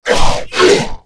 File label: attack; tera creatures; abomination guard